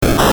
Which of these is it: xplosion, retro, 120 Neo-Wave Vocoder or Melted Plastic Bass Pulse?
retro